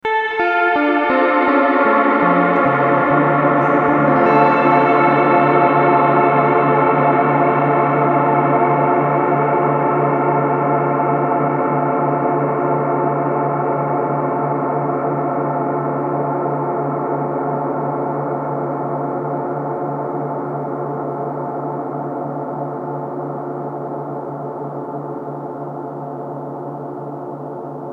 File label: Large Hall